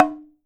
OKAWA, JAPAN PERC; AKAI S6000 CD-ROM; WorldSounds

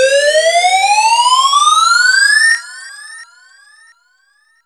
Lifter + FX; AMG - Prototype Drum & Bass

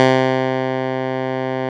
Juno Clavi; Club-50 - Foundations Roland; Clavinets

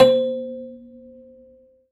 Rubbery Piano, prepared piano; Prepared Pianos; Total Piano; Rubbery Piano 2 -ped

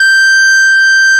G5, SYNTHESIZER ANA LEAD; AKAI S6000 CD-ROM - Volume 1

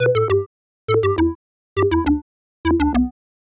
136bpm Lines n Loops Synths, uk garage samples

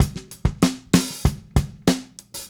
Drums Fill, 70s Analog Funk

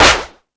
Percussion FX, E-MU Pro-Cussion; E-MU Pro-Cussion-1

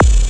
BD, Dance Mega Drums; Best Service

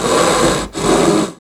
FX metal; Sound & Vision - Gigapack II

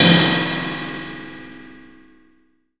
OLD CYMBAL; Zero-G; Funky Elements

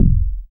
Bass SH-101

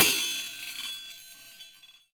ZEMBONDT, PERC; NorthStar - The Wizard VOL-2; TSAR PERC

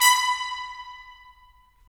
BRS, Tps Stacc; Roland - LA Composer vol. 4

